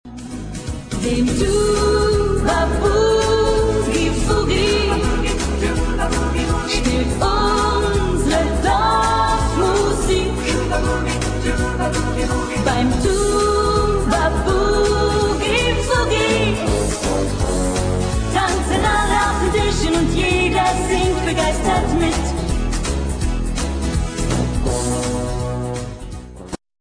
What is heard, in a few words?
Tuba Boogie